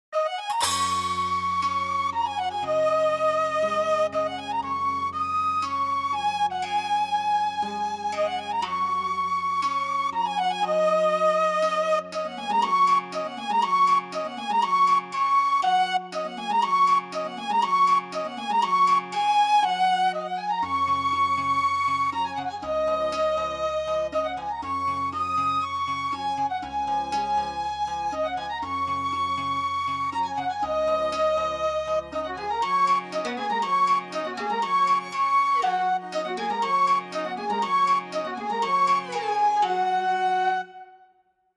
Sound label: Authentic Chinese Groove